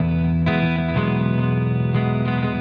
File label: Happiness Rhythm Guitars; ACID MUSIC